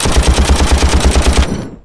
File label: gun; StarSiege